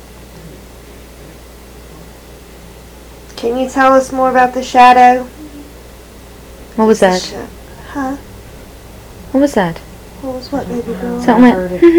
Live EVP Recording Unexplained little girl hummin AMPLIFIED